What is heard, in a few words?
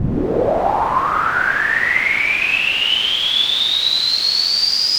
resonator